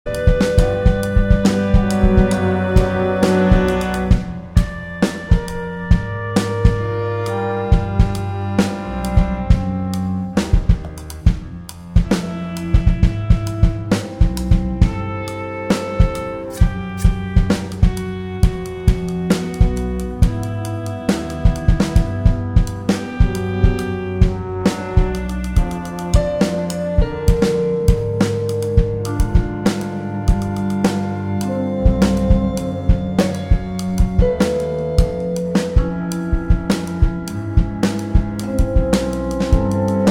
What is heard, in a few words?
alarm will sound